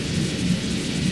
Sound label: paper rumble; deltarune yellow translation